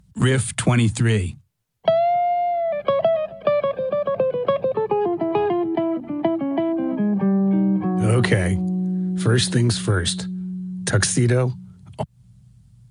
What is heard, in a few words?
Riff